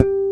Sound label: finger bass; AKAI S6000 CD-ROM - Volume 1